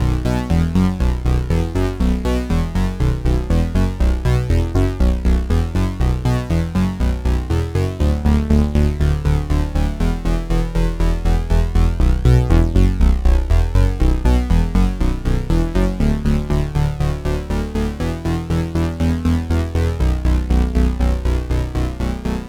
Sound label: Taura Bass